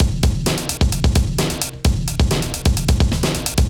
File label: HipHop Beat 85bpm, MIDI Drum; Ableton; Factory Packs